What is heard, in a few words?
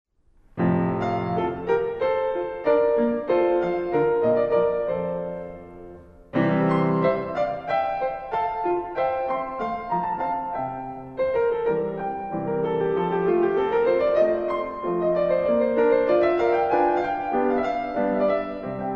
italian bach first movement